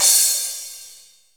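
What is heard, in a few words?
cymbal, real mega drums; Best Service; Real Mega Drums VOL-1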